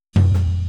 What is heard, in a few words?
Boogaloo Fill Tom Floor, 82 BPM, Drums; Sonic Foundry (Sony Creative Software) - Drums from the Big Room The Mixes